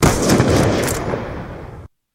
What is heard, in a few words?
ballista; bo2